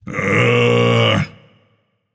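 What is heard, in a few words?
non-verbal seriously; smite; atlas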